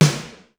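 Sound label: lo room snare; sr16 sd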